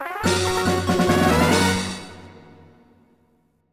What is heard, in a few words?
Fanfare! Jackpot; FRLG